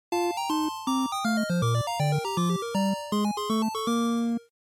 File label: NES Sports Clear